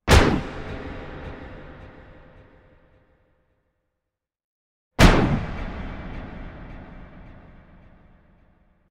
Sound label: Gun - Sweetened clip ejection, with multiple echoes; Hollywood Edge Sound Designers Tool Kit